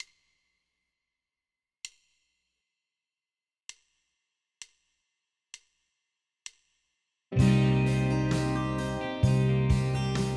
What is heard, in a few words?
i-VI-iv-V7 min